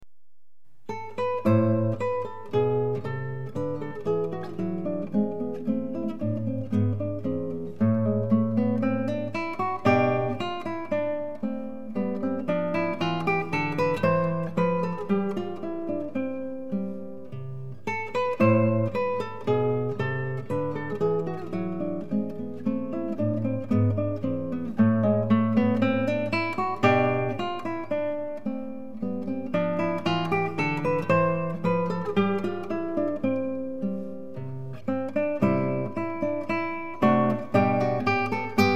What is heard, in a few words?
Bouree, GuitarSolos; Bouree II; from Cello Suite 3